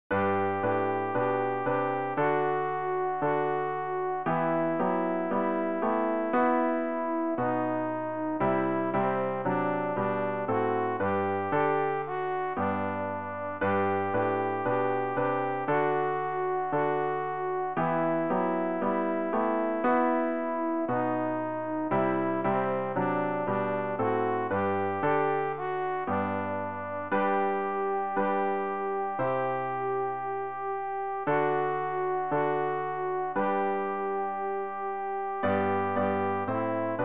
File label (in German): alt, Übehilfen für das Erlernen von Liedern; ref gesangbuch; rg 162 gott ist gegenwaertig